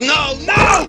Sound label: gurp; opentdm; McClane